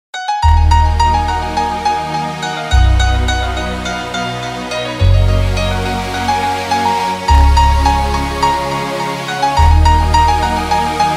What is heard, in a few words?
Piano Music